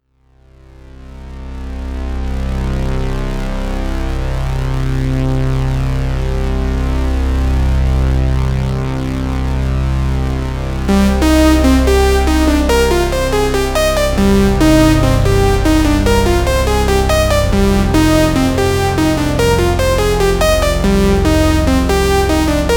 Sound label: Jupiter X